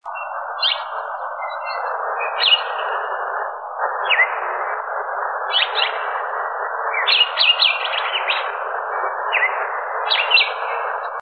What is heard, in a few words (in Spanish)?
voz cardenal común